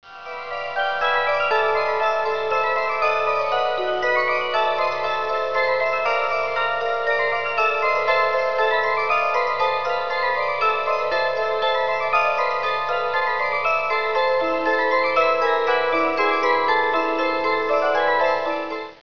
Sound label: celeste; halloween